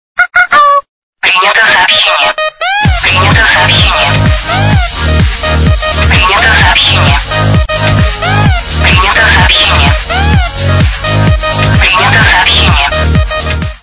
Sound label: dance sms